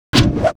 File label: High elf Warlock attack